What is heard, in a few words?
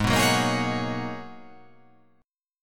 G sharp Minor 11th G sharp, chord